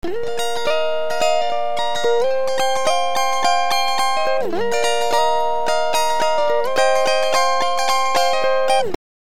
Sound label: Pitch Shift octave, Raised one octave; Up one octave